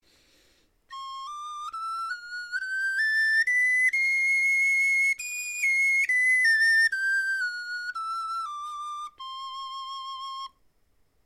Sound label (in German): tonleiter, knochenfloeten